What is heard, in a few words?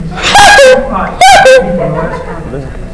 coocoo